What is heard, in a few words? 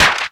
SD, 160 BPM, Drum & Bass; Ueberschall; Drum & Bass Flash Trackz